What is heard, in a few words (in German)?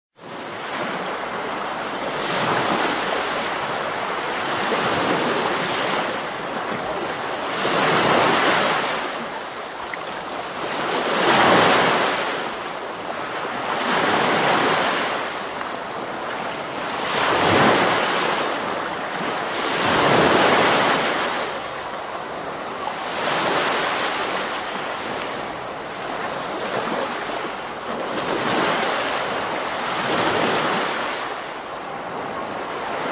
Meeresrauschen Wellen